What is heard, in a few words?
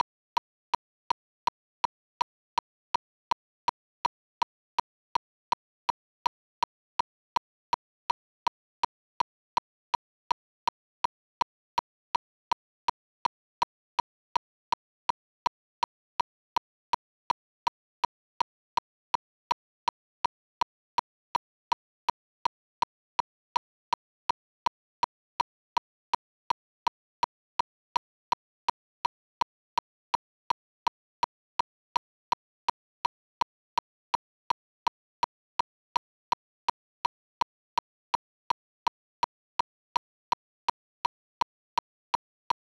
click